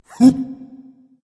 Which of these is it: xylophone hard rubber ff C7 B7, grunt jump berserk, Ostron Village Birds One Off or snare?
grunt jump berserk